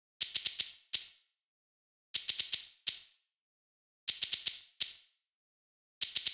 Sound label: Percussion; Lps